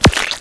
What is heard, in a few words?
hurt; paintballav